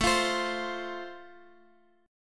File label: A Minor Sharp 5th A, Guitar, Open G